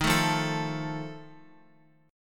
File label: D sharp Suspended 2nd Flat 5th D sharp Guitar; strummed; JGuitar